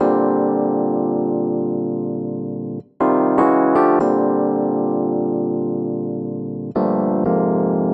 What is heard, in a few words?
120 Gm 9 add 11 Rhodes Chords